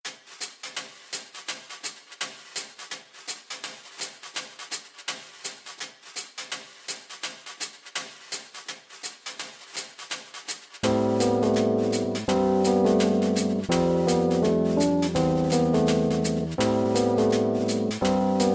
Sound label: swing, 432 Hz; music in action